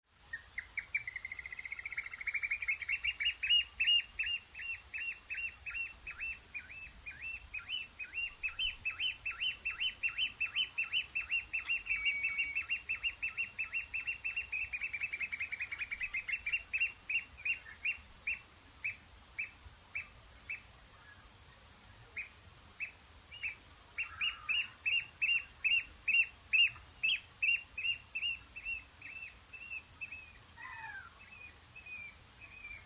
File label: oystercatcher, nocmig